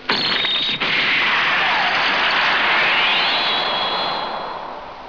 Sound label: explosion